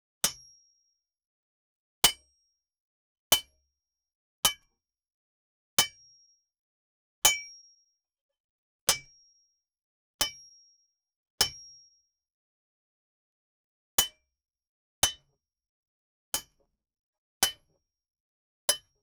hard iron being struck, clanging

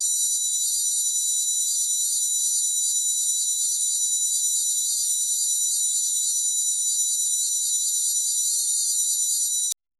boink comic sfx

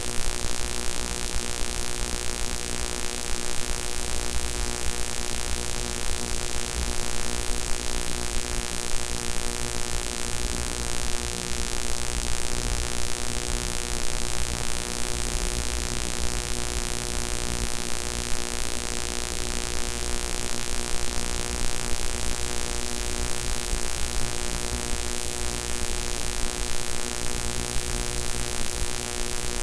the sound of electricity, power

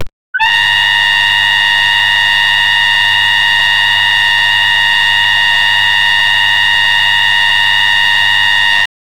bass boosted screaming

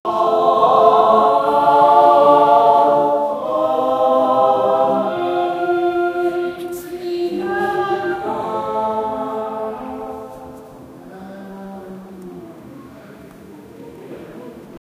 lots of chinese people si; culture

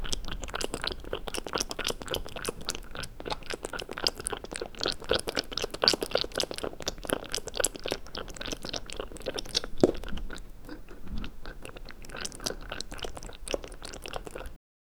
the cat is drinking a

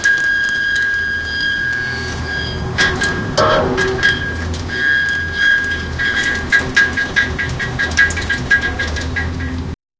ambience rushing water, underwater

